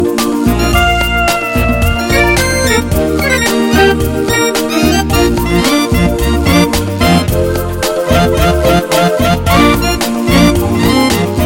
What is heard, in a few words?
A Great Soul Ballad